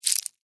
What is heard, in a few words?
Insect Claw Small, Snap, Movement, Bug; Animal; Insect Movement Sound Effects